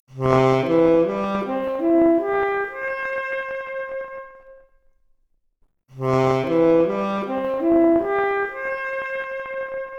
Saxophone arp, Stereo output noised; 4bit

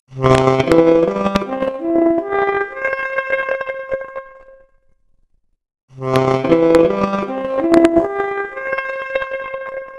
Saxophone arp, mp Stereo output noised, 4bit

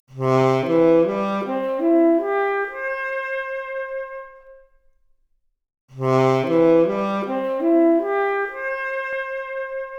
Saxophone arp, Stereo output noised; 3bit

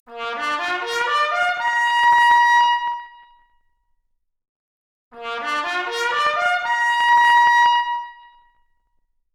Trumpet arp, Stereo output noised; 4bit

